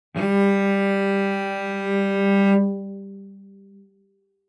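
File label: Cello arco fortissimo sul D G4; MIS Pitches - 2014; Strings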